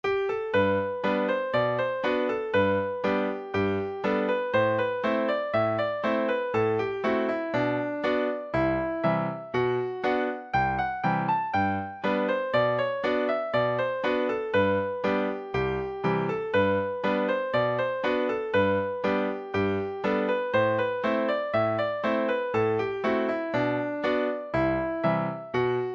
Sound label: hornpipe, session tune midi; trade wind